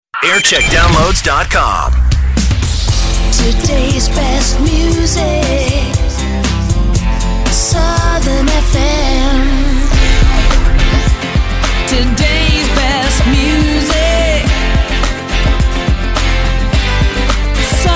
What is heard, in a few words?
jingles; southern fm